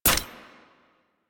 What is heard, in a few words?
Jet Turbine Pistol Mech; Warframe; Tenno